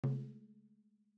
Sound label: TOM MED, drum; t series drum pack